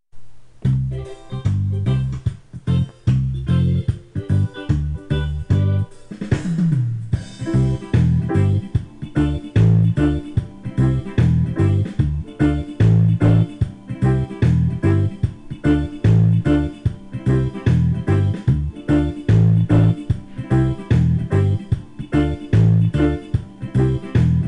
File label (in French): acc reggae avec accord